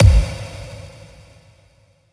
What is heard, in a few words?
Impact